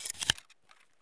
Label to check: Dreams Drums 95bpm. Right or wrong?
Wrong